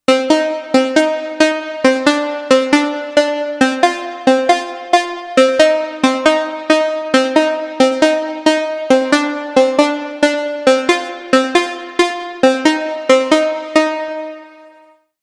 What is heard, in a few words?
UNO Synth Pro X Presets